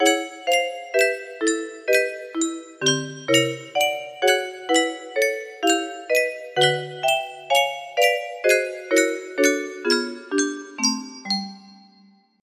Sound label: semi spooky